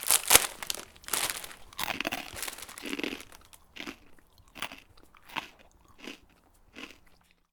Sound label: snack use; Escape from Tarkov